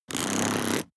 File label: plastic mechanical wheel rattle